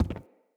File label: Walk Wood, Footsteps; Enemy; Mockery; Mockeries